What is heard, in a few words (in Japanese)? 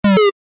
バビュン レトロ